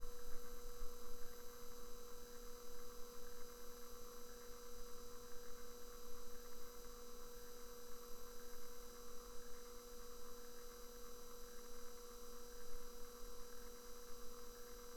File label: CASSETTE TONE Motor only, pause, counter perspective; Tone Manufacture